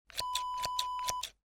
scissors snip; Scissors Snip Wav Sound Effect

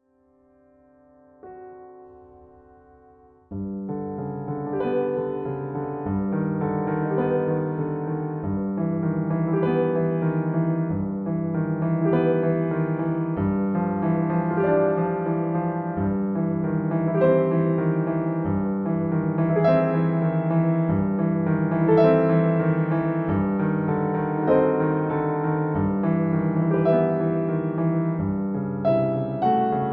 Accompaniment G; rimsky korsakov ne poy krasavitsa pri mne

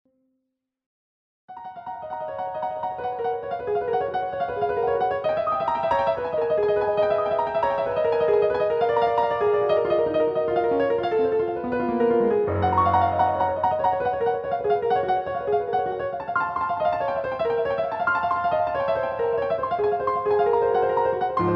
Piano Roll; Etude Romantique Op 132; Played by Chaminade

